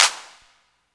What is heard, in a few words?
CLAP HOU, Dance Mega Drums; Best Service